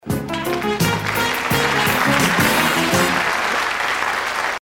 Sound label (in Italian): stacchetto; Stacchetto di presentazione di Cinzia - 1986/88